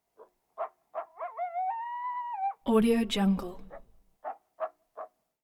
coyote barks howls cries echo

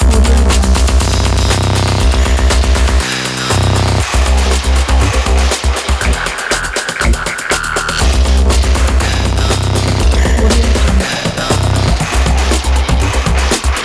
breakbeat glitchy intro

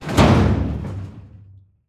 mechanism cargo door open; soundmorph

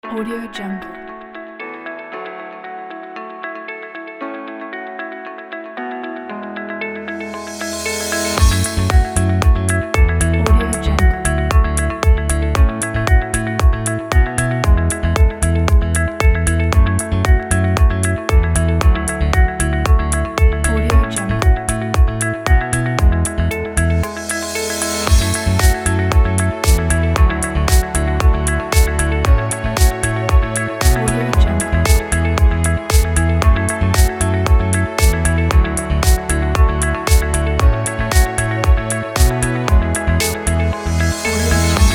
upbeat corporate inspiring

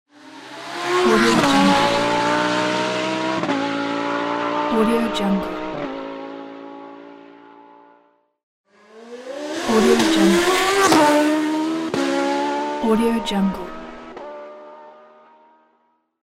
race cars pack

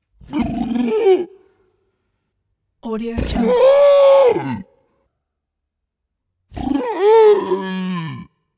humanoid creature voice screams wail snort

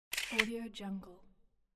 camera photo shutter sound; greenwaysound

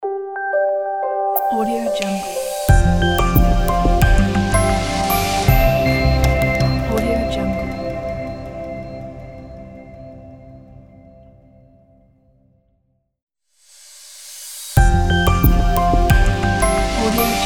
happy logo

